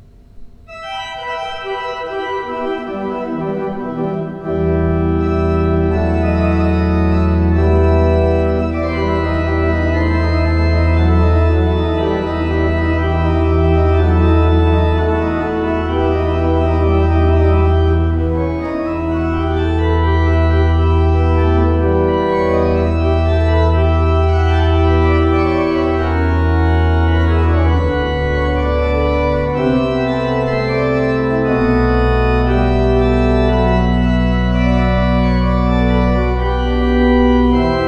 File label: toccata; pachelbel; postludes